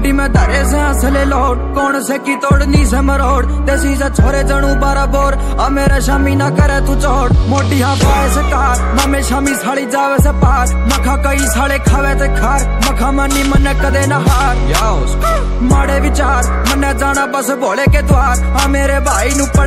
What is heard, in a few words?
Haryanvi